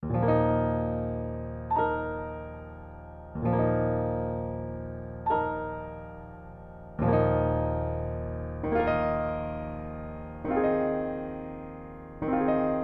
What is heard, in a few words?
loch lomond accomp